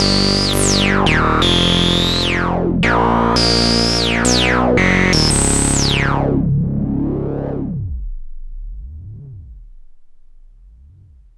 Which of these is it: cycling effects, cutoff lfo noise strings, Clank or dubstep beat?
cutoff lfo noise strings